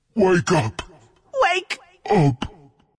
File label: death; dota; ogre magi